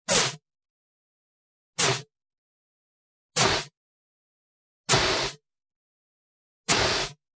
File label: Fire extinguisher